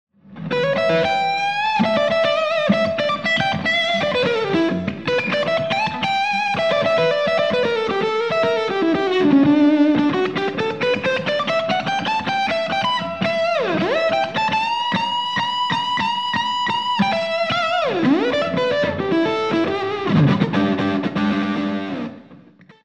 SD1 Full Gain

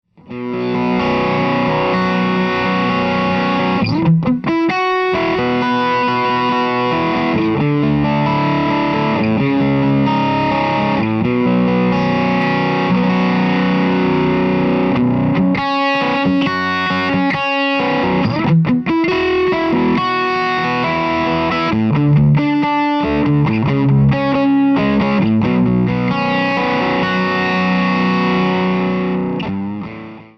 Opto ODR1, Line6

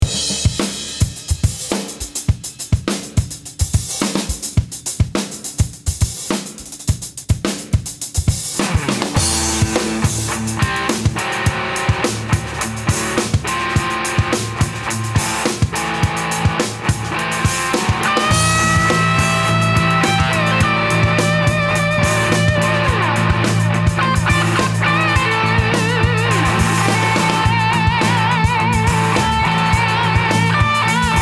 DOD High Gain

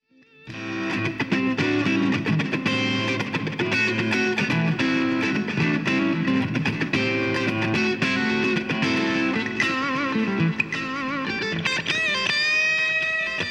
DL4 Delay Mod TS7

